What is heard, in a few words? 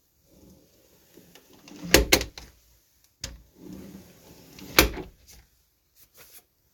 Window cleaning